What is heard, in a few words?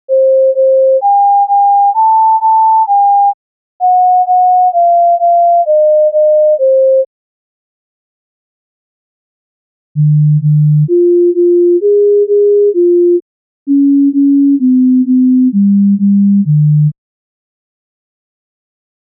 Mel Scale Music